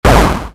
Boom Small

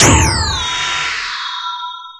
Powerup

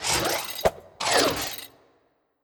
Flying Dagger Launch

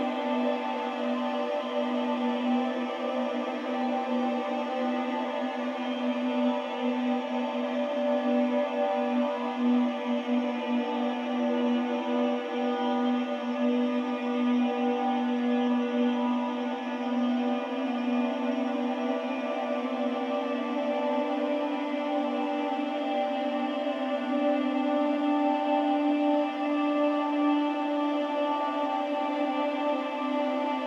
Amb Musical; viewfinder

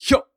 Attack; Elder Hu

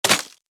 Arrow; Pecheneg

